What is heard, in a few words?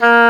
Oboe D'amore; Roland L-CDX-03 Disk 1; Oboe 4-8